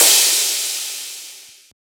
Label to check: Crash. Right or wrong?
Right